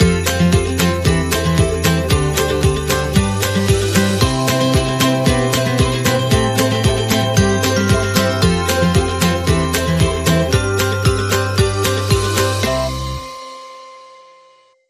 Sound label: Upbeat Acoustic Christmas